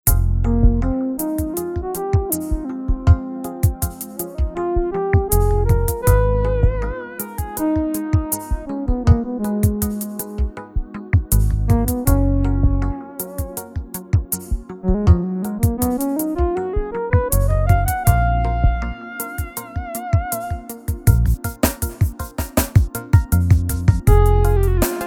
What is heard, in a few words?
chill; Montage; chill leader